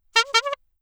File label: classic horn double, bicycle horn, Anechoic; OPENAIR